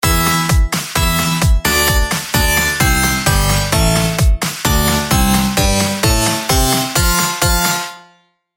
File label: samsung tune